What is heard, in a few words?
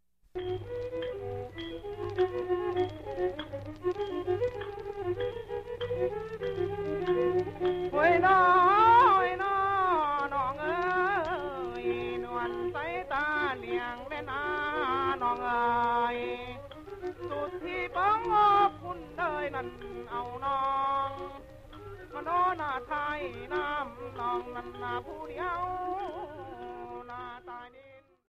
Love Song, Laos; Folkways